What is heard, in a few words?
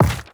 paper drop, inventory; ui